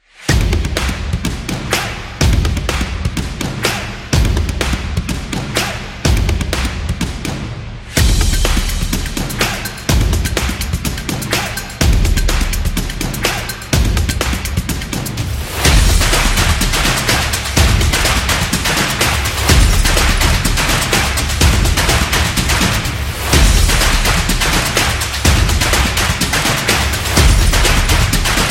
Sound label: Upbeat Claps, stomp; stomp and clap